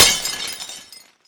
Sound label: glass impact; Angry Zombies